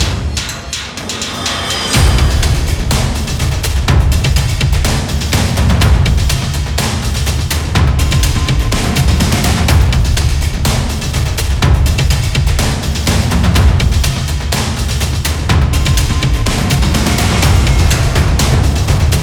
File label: Battle Main Loop No Guitar; Crossout